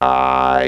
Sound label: Bass Box Vowel I; Mo' Phatt; Digital Sound Factory Mo' Phatt